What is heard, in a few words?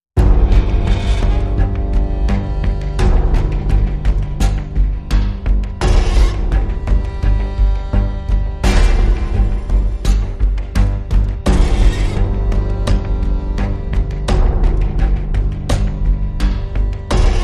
Weight Of Darkness, No String Chords